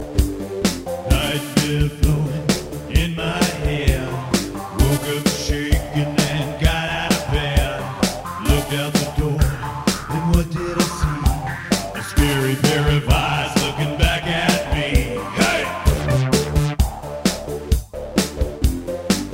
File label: night rhythm